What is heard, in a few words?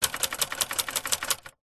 office typewriter buttons keys presses typebar strikes fast x8